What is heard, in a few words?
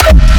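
Raw Kick, Hardstyle; Kick Compact Pack Vol.1; raw hardstyle kicks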